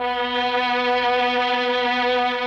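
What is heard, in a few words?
MELLOSTR, Mellotron Violins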